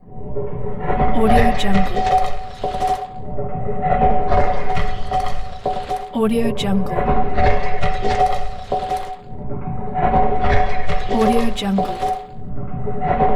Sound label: earth drilling machine